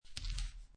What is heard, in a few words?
Dice Grab Collect Two Dice From Felt; Casinos